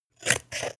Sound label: scissors cut paperboard